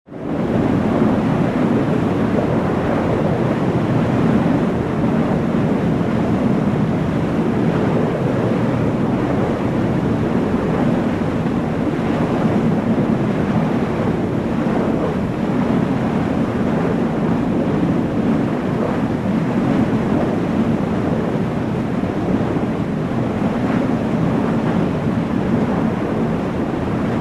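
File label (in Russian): реактивный истребитель F-14 стабильный полёт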